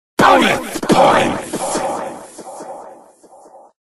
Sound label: powerup money